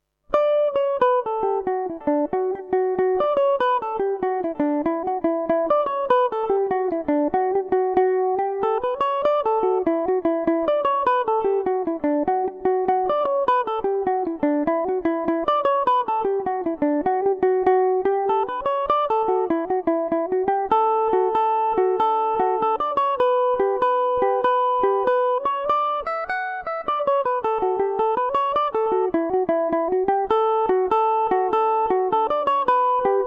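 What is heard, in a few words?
D major revelation, Irish mandolin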